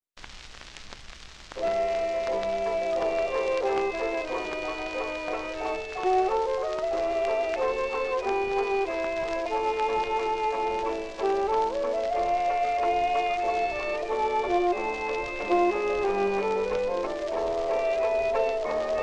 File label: Orch. acoustic; Columbia